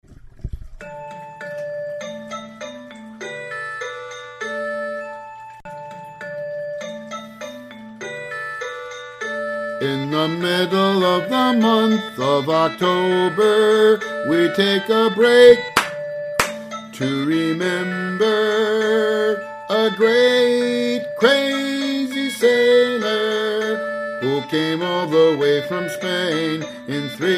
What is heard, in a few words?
Columbus Vocals